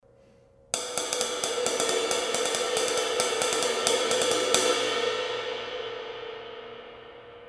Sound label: Kerope, cymbal sound